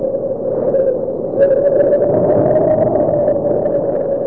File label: tunnel wind